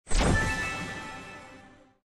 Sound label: get boss; Epic Toon FX